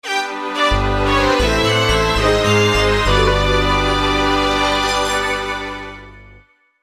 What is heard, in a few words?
Game Over; Chiptune; Gamecube; Paper Mario The Thousand Year Door